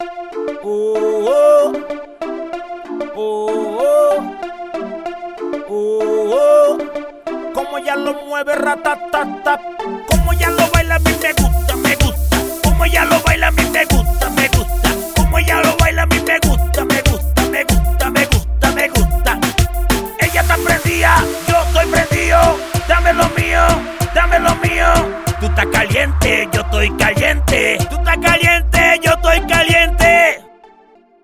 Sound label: Kit D Full Loop 95BPM